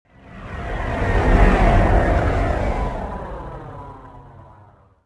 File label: traffic by